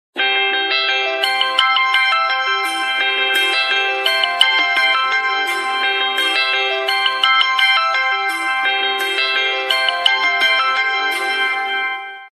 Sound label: Jingle